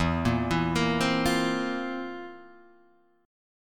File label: F Major 7th Suspended 4th E